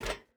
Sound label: footstep burlap metal run; Rust